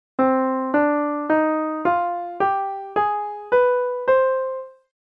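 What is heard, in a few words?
c minor scale; scale mode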